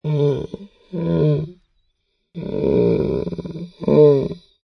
Creature Hurt, Monster or fantasy animal hurt or wounded. Moaning, whining; Moaning, whining, sulking, muttering